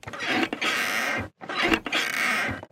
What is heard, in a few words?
Couch Squeak Creak; Creaks n Squeaks; soundbits